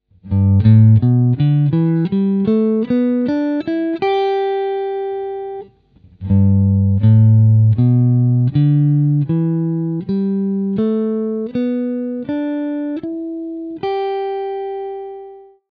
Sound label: Major pentatonic, guitar